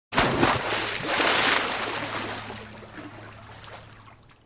Large Splash Or Dive Into Water; Sports

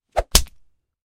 Whip; Hollywood Edge Martial Arts and Human Impacts

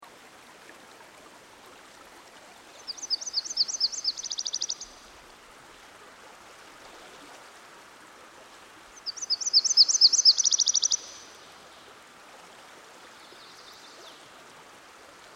Myrtle Warbler; Dan Gibson; Songbirds By The Stream

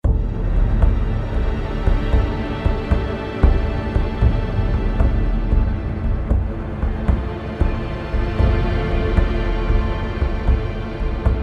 Ambient; Ered Luin

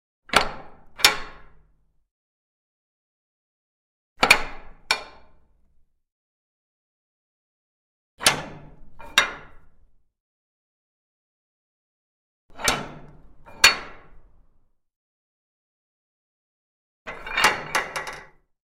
Metal Crypt Gate Bolt Action; Hollywood Edge Historical Series; cd4 Castles Palaces & Monasteries

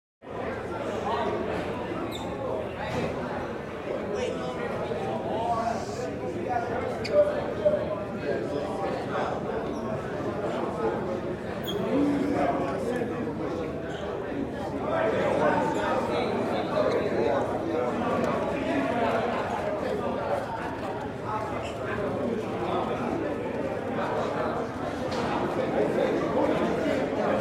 Prison Mess Hall Large Crowd Busy; Interior Crowds

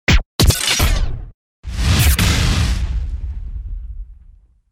Delayed Reaction; DJ sounds

